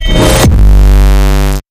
Hit Me With 808

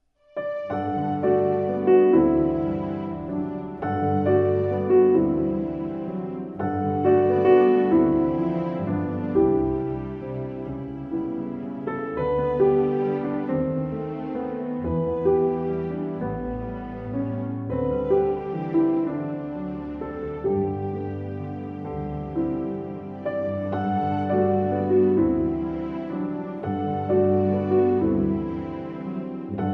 With Strings

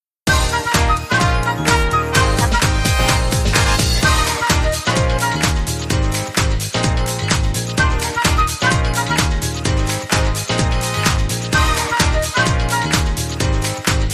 Brass Stab; 30sec

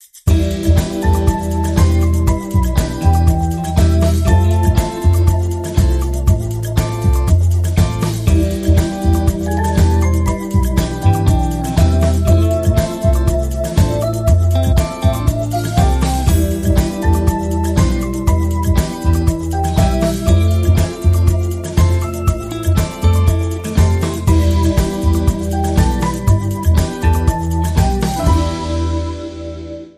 Awaking Samba

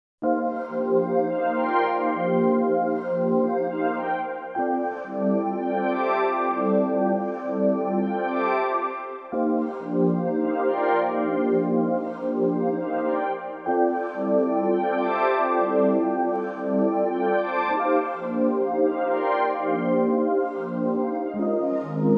Rhythm

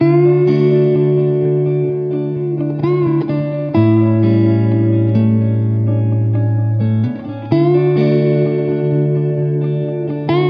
Gentle Along The Way, No Drums

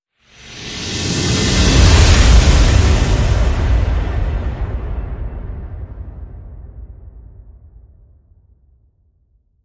The Final Whoosh

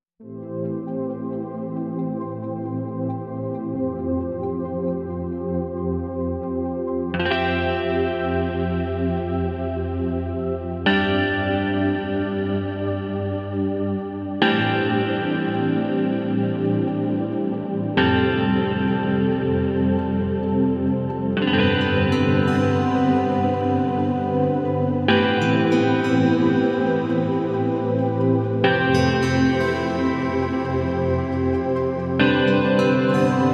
No Drums Mix

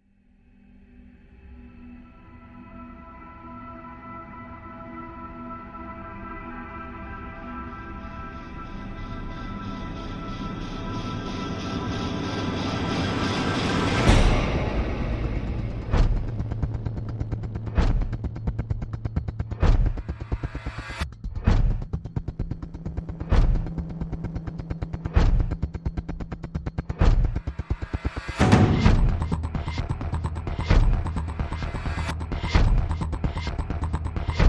Drums

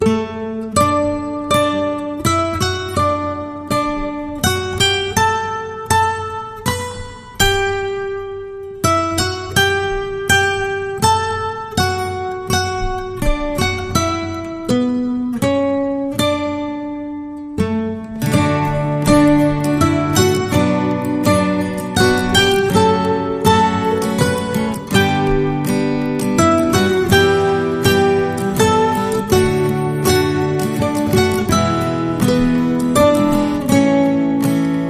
Acoustic Guitar

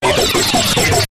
Quick Garble, Taylor Grand

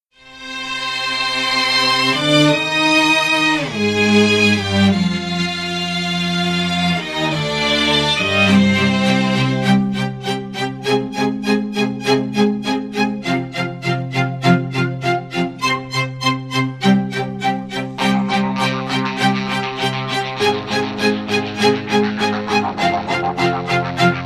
Strings, No Drums

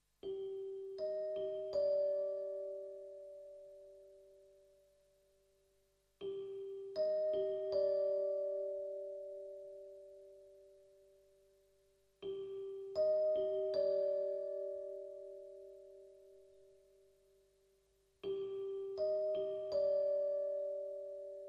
Bells

